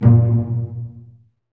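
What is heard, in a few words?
STRINGS SECTION 5; Roland - LA Composer vol. 1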